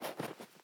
snow jump; teardown; ps5 windows xbox series xs

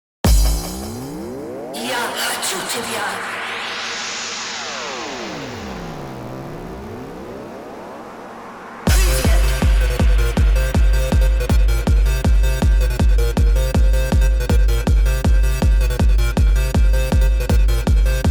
Hardbass

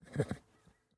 horse grunt; teardown; ps5 windows xbox series xs

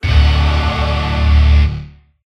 stinger life; Goat of Duty

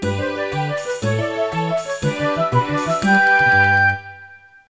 Practice Level (Success); Charlie II; MS-DOS, Windows

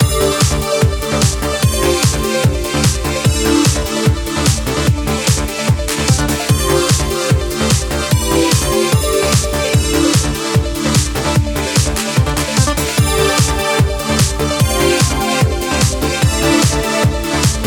ice Loop

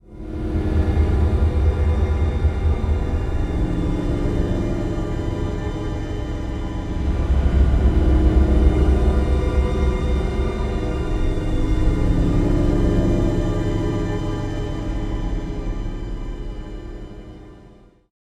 Napalm; Sounds Cinematics

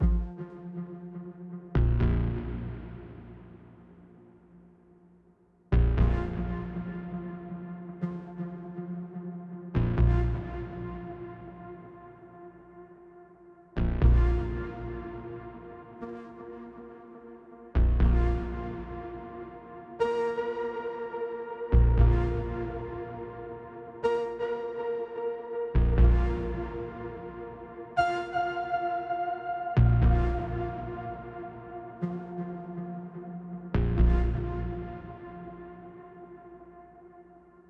Loop 120bpm; Mysteriblip; jenny leclue detectiv

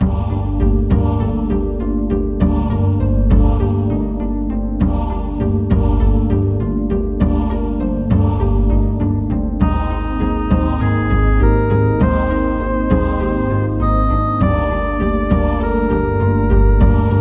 Dungeon; metal as phuk

